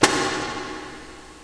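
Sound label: hi pedal; drums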